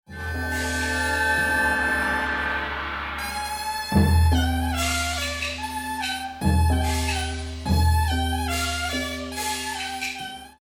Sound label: membranophone